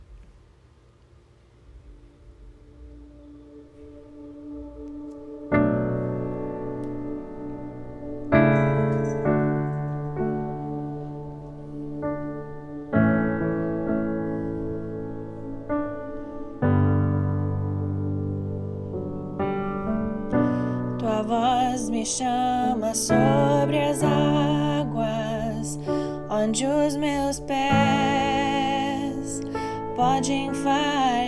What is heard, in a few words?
piano karaoke